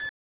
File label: IBIS piep, volvo; volvo 9900; Vehicles; AI volvo 9900; Freyfurt Beta